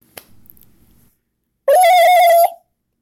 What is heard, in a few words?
turkey gobble music ringtone